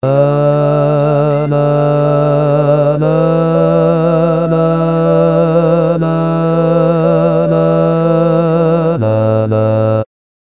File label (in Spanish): introducción sevillanas bajo